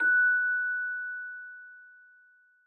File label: Celesta Higher F#